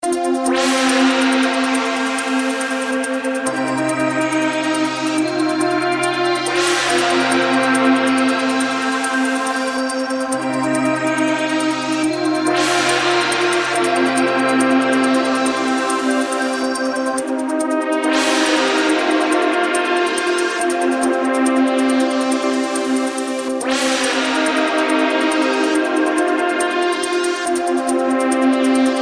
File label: Electronic Sweetness